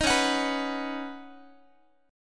C sharp 7th Suspended 2nd Suspended 4th C sharp down Guitar; C#7sus2sus4; strummed; JGuitar